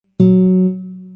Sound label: tone fs, guitar